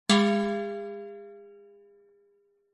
bells medium bell handmade hollow thin hit strike chime